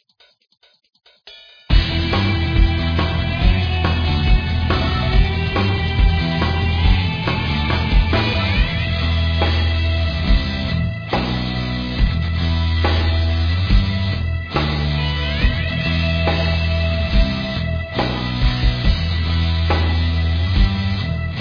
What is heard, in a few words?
bgm; gungang